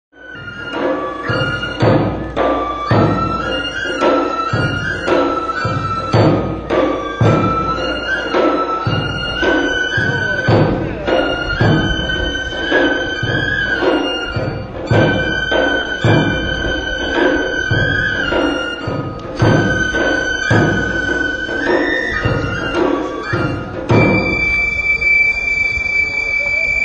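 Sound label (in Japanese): 人形囃子, からくり人形の演技の際に演奏される; 旧からくり人形（乱杭渡り）の演技の際に演奏される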